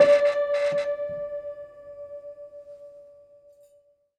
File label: Prepared Piano; Battery 3 Sample Kits